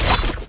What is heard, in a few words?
Woosh